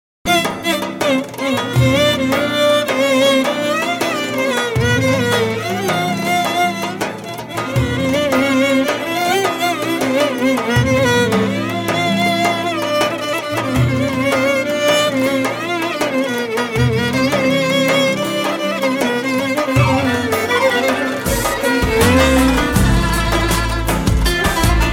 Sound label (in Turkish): Keman Ve Kanun